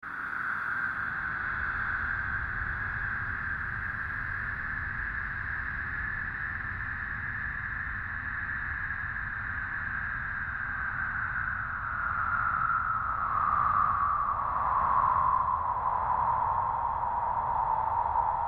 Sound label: surge fade slow, Various sweeps, pulses and drones; Rise And Dive; Rises and Dives